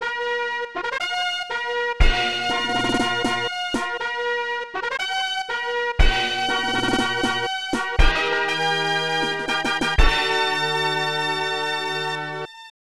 Race Start Fanfare; Derby Jockey; SNES

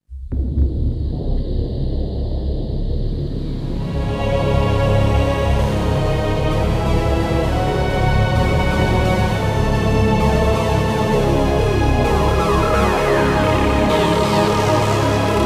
BGM; baroque; wii

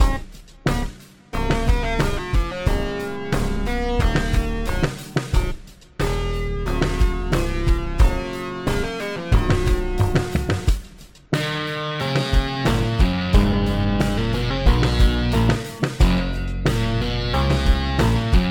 Combat BGM; FPS Chess

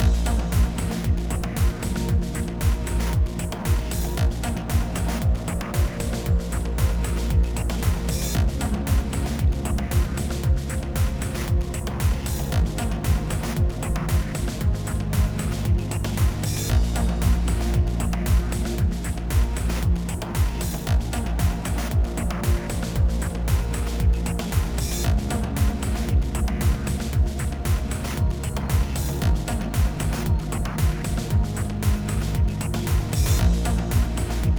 Combat 115BPM

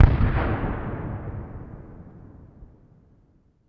Factory Explosion

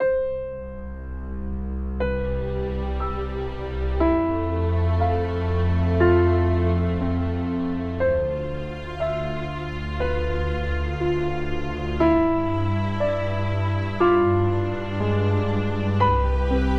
Speeding Music

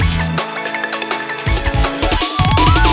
Transition; sky rogue

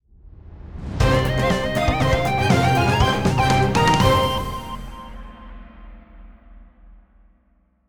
Victory Music Short; super saurio fly; switch windows

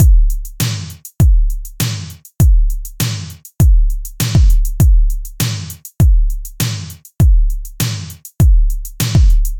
Lesson C Drum 100

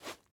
ice jump

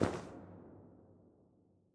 Cave SFX, Tent Flap

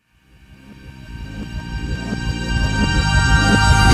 Race Start; Summer Catchers

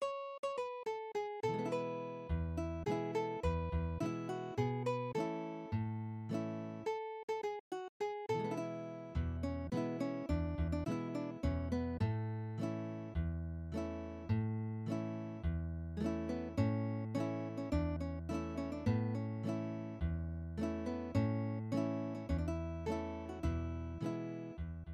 MIDI BGM

